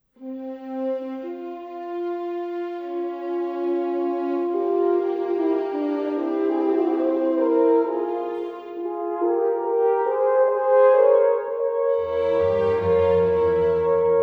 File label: Concerto for Two Horns in F Major, Romance, Allegro